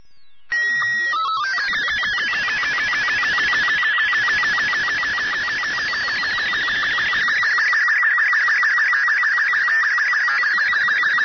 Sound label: ambient; Portal 2; Source Engine; video games